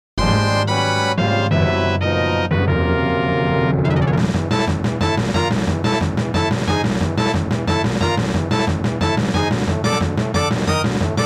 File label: Boss Battle BGM; zelda the music